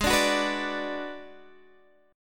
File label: G sharp Minor 7th Flat 5th, Guitar DADGAD; x,11,12,11,14,12